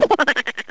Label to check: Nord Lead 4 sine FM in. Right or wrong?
Wrong